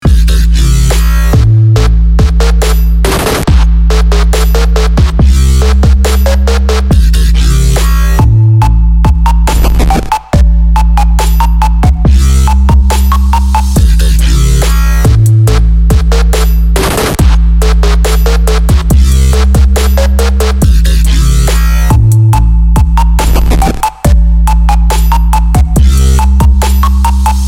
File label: Boss Stage